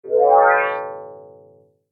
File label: non tonal